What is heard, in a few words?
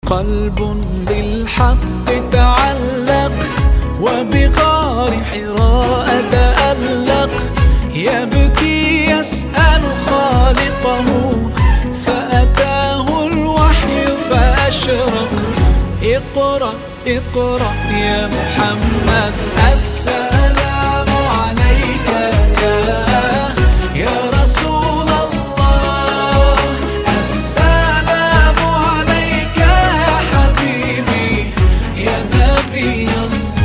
(Arabic Version - Bonus Track)